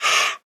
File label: Breath In; FREE SFX PACK VOL 2